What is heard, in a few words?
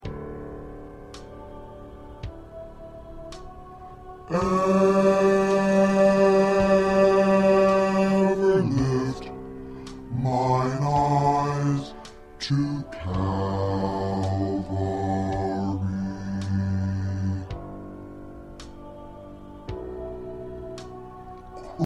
Bass